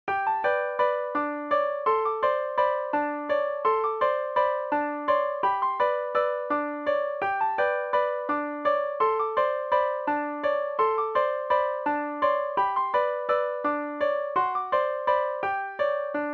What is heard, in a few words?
Waltz; Willards